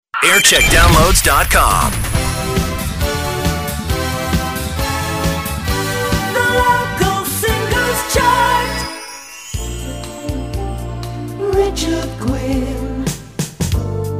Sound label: jingle montage; mercia sound